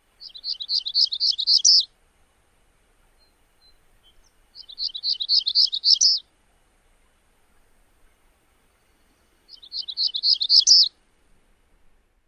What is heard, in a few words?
songs